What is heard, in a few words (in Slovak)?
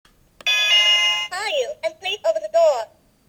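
Bezdrôtový zvonček melódie ukážka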